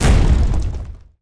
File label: earth impact; MagicArsenal